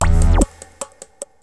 T WAVE, Synth Waves, Techno Trance

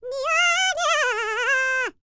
BLACK SEA, World Voices; ILIO; Vocal Planet VOL-5

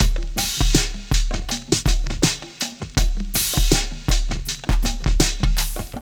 beat 80; AKAI S6000 CD-ROM - Volume 1

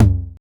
909 TOM MID; TR808+909

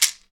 SHEKE, Afro Shakers, Shekeres

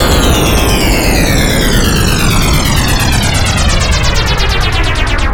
FX SWEEP, SYNTHSWEEPS

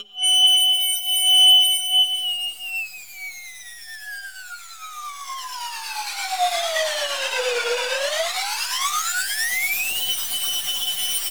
WHIS DOWN, Anime Sound FX; COMIC

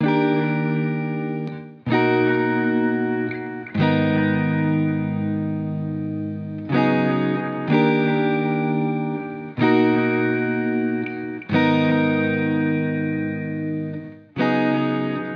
Guitar Bpm125 Key D#, 70s Guitars; Fox Samples - Back to the 70s Guitars

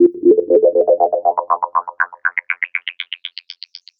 Progressive Synth, Trance

